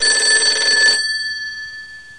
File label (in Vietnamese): Tieng chuong het gio